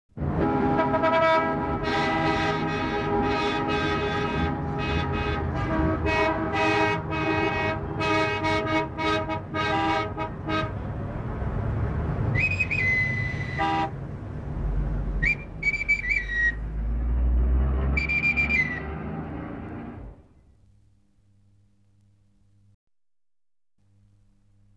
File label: Traffic Jam